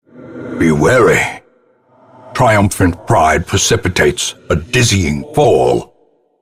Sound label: battle win triumphant pride